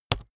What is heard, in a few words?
keyboard laptop enter press fast hard; kommunikation; computer